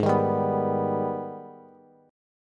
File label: G sharp Minor Major 7th G sharp down Bass; strummed; JGuitar